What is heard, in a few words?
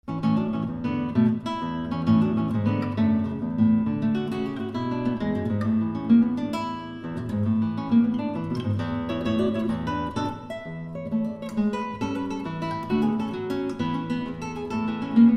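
danza para amparo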